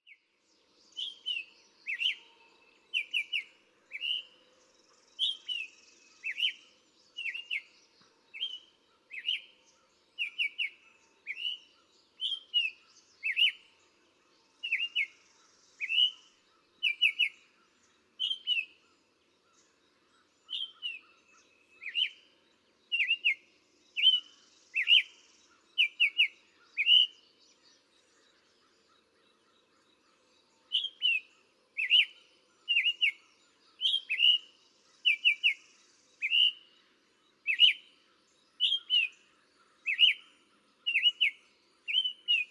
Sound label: Summer Tanager